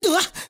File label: Damage